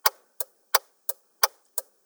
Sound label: INTERMITTENT; SIMUZENSOUND